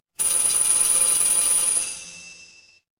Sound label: office ringtone